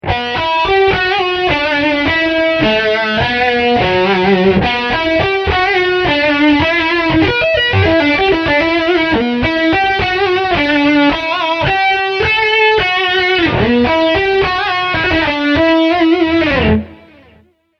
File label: Lead line Liedlein